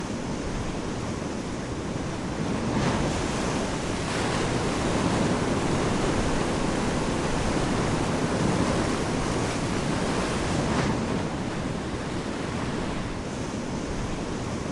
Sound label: surf